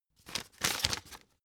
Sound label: old flip page fast